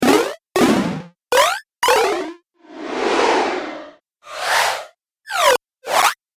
Videogame Variety Pack; Video Game Sound Effects

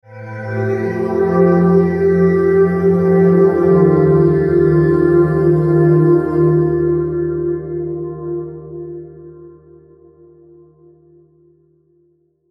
Logo Vocal Sweeper Reverb; Blastwave; Sonic Logos

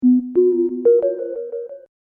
Hip Hop Synth Licks 90 Bpm B Straight; In-The-Dark Straight Var A; Royalty Free Synth Loops

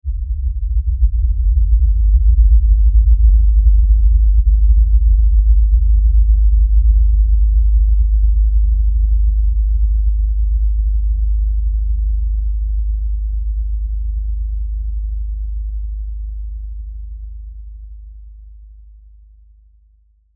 Sci Fi Spaceship; Sci Fi Spaceship Pass By Lfe Rumble; Spacecrafts

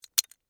Legos Drop Move, Together; Foley; Drops (Sound Effects:Objects)

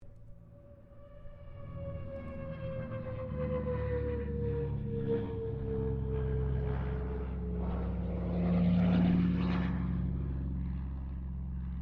AIRCRAFT CA-13 BOOMERANG PASS DISTANT; Airplanes

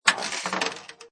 Pinball Sound Clank With Ball Travel; Video Games

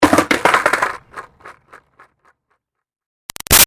Skateboard Drop Crash Rocks Back & Forth; Skateboarding; Sports; Skateboarding Sound Effects

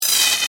Static Buzz, Production, Element, Static, Buzz; Sound Design; BlastWave

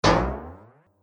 Arcade Game Boing Jump; Video Game Sound Effects; Retro Inspired; Nintendo, Atari, C64

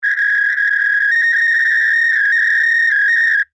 Office Fax Machine Connect Tone Short; Electronic; Fax Machines

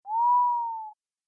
Cartoon Snore Whistle; Cartoon Sound Design Sound Effects

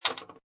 Pinball Sound Metal Clank; Video Games; Sound Effects:Games; Lynne Music

